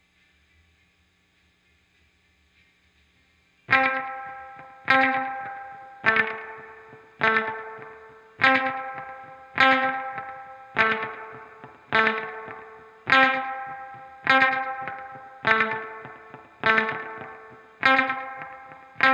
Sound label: guitar volume swell